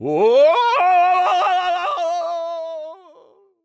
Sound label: falling; Torvald; Paladins